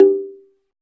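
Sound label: SLIT DRUM G4 P; SLIT DRM1; Heart of Africa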